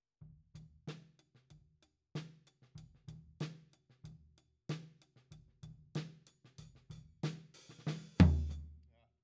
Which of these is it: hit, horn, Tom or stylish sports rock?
Tom